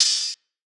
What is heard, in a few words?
Open Hihat, Hip Hop Drums - One Shots Cymbals; Cymatics - 9God Hip Hop Sample Pack